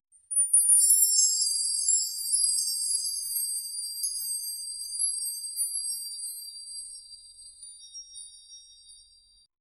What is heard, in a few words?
soft mystical chime